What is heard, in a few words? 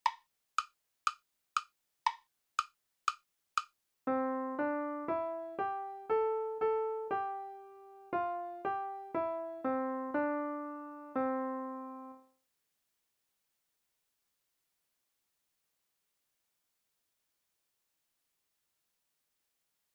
melodic